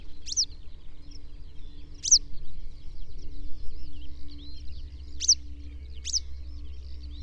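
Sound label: blue-headed wagtail flava, tipperne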